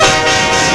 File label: clang